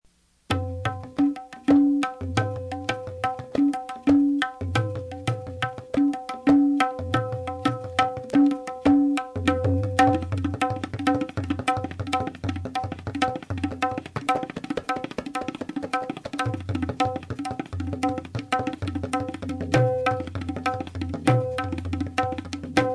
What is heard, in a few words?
tabla; Vince Plays some of his favorite drums